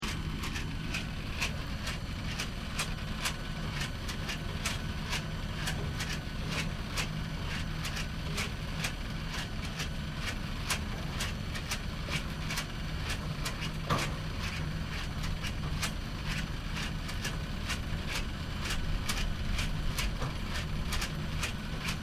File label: Construction Site Machinery Unknown Maybe Pump Operating Loop, Japanese construction drill site